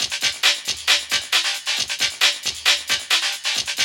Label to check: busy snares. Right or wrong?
Right